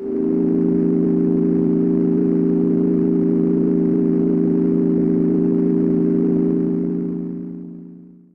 PAD

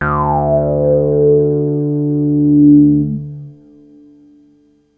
bass D1

